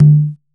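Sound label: Conga, Roland S50 Percussion; Drum Samples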